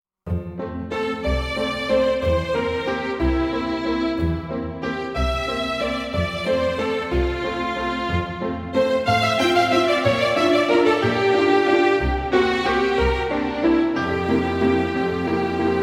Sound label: song trombone